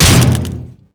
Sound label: punch glass